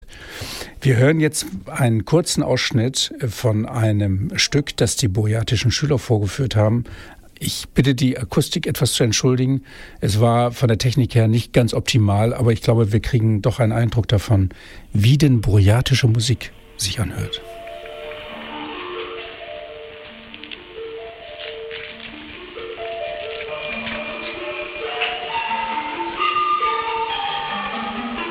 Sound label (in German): burjatische musik; os radio